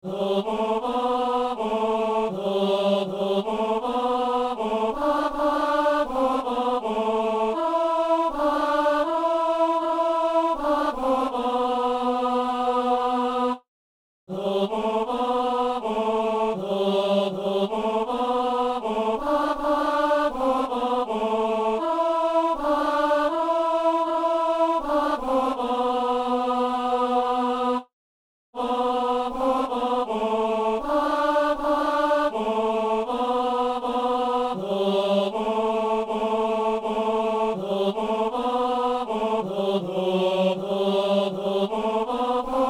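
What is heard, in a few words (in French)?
ténor; Saint-Denis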